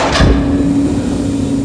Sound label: door move; lv426; doors